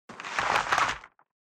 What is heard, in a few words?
Rolling